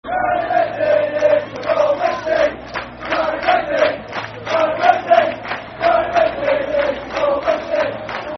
Football Chant (Soccer Songs); Carlisle United; fanchants-com

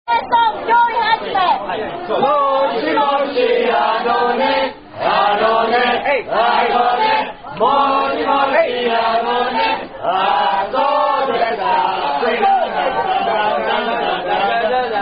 Down Down Song; Moshi Moshi Anone

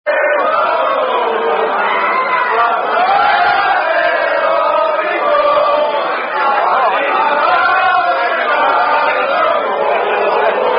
Sing-A-Long, Rugby Song; Bingham Cup 2008

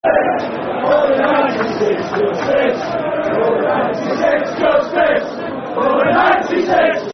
Football Chants (Soccer Songs), Liverpool; Justice For The 96; Liverpool Lfc

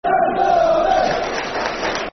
Football Chant (Soccer Songs); Martin Allen's Blue Army; Leicester City Lcfc

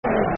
Sit Down Shut Up, Football Chant (Soccer Song); Watford Wfc

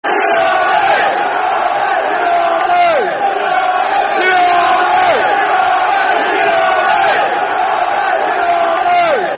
Yid Army, Football Chants (Soccer Song); Tottenham Hotspur Thfc

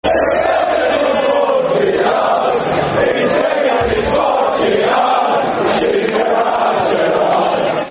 Football Chant (Soccer Song), England; Stevie G Big And 'ard

